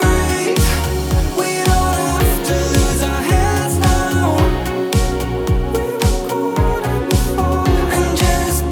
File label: Pop Before